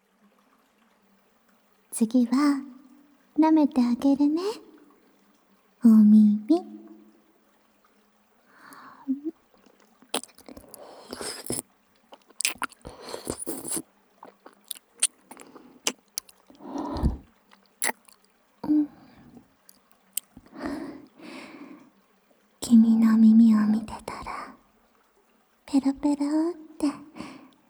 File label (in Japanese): 足湯しながら耳舐め; とある秘境洞窟足湯にて; 足代はちさとｾﾝﾊﾟｲのおくちで; ごっくんフェラ